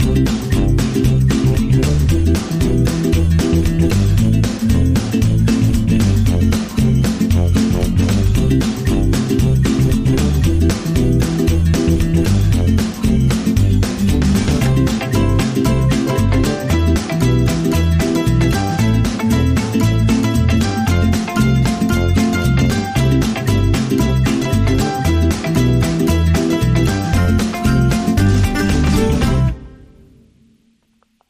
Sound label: Summer Vibes, No Whistle